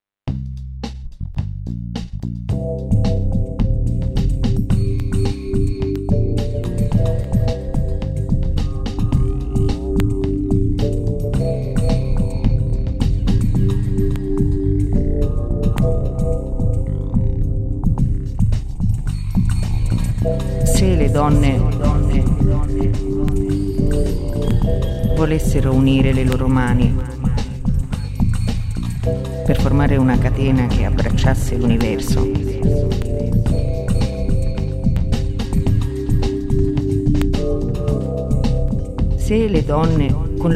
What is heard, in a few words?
Color Drum